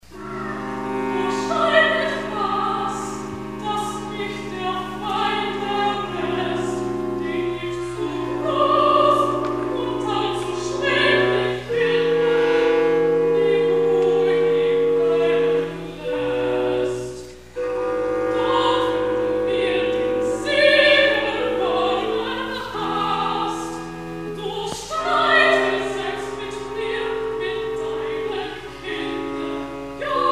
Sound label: Alto